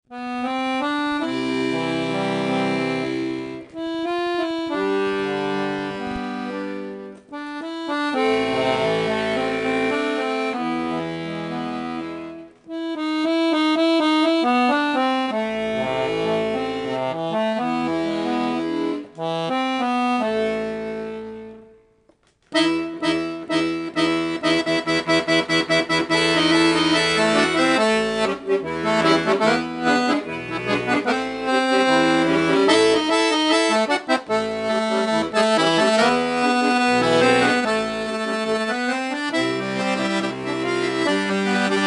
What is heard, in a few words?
Shuffle